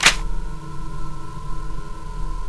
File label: clip in, weapons; awp; awpbuff; cstrike